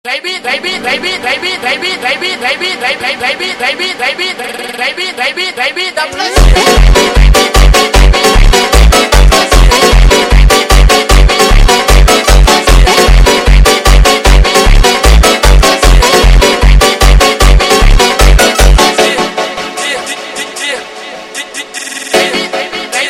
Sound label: Sped Up, phonk music